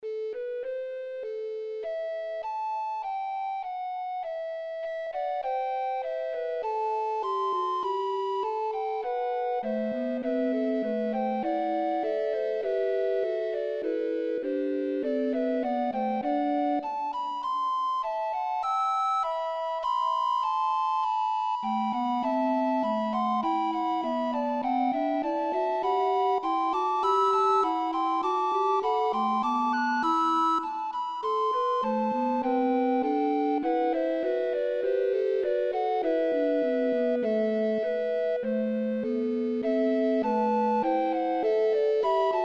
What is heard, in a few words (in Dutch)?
Nostalgie blokfluit trio